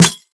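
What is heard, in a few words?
sharp snare sample f key, acoustic snare; snare drum samples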